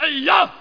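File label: Sub Empty; Sub-zero; PLUS! Themes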